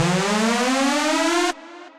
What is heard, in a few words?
Air Raid Alarm; Effects